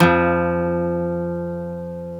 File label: GTR 12, Ac 12+ E.12; Club-50 - Foundations Roland; Dual Guitar